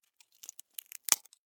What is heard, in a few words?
Insect Termite Bite Wood Splinter